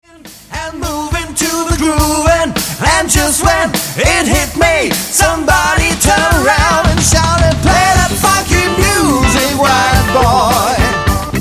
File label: short funky